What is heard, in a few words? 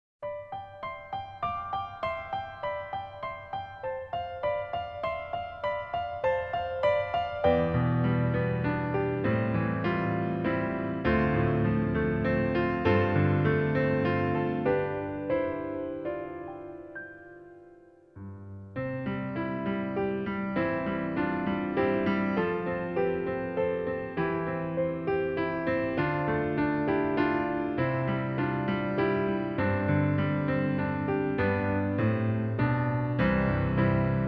Yoru No Uta, Piano Version